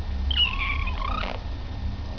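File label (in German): zirpen, wellensittich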